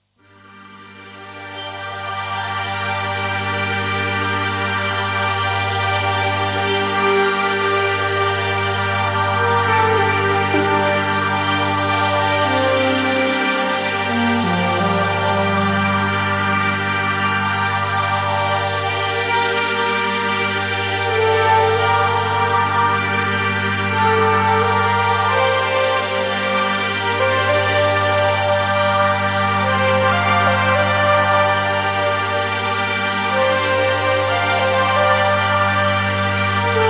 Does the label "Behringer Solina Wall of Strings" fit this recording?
Yes